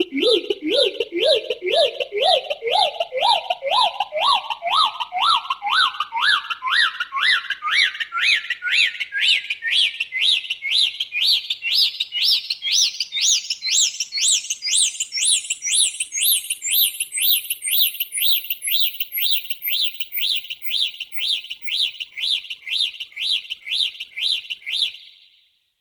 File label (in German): bird tweet tonleiter